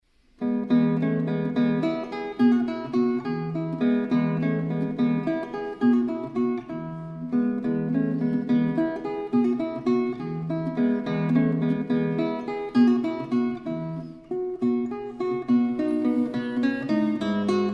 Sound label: guitar; Valtz In E Ms96; Paganini